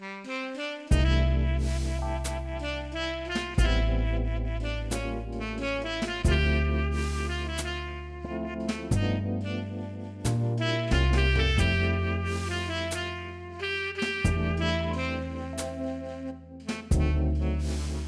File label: BGM